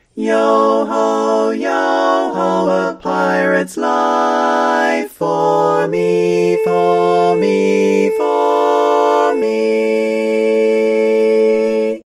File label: Female All Parts, barbershop tags